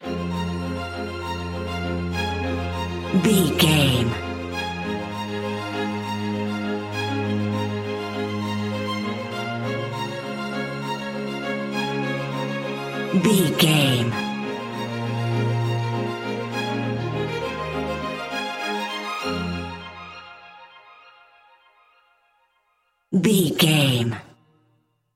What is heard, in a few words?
inspiring new way 30 seconds strings only